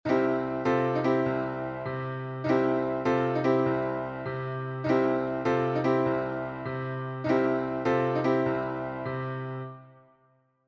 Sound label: backtracking, I - (IV de IV) - (IV), gospel piano; I-(IV) ( ou I-(ii) ); Enchainements d'Accords